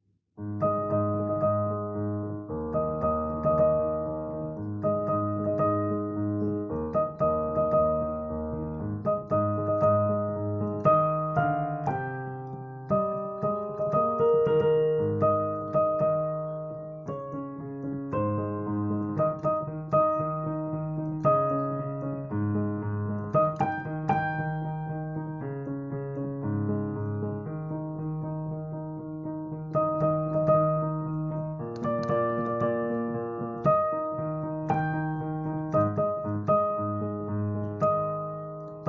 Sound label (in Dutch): hoge piano